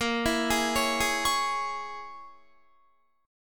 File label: A sharp Minor 7th Flat 5th A sharp, Guitar; 18,19,18,18,20,20; 4E,4A,5D,5G,5C,6F; arpeggiated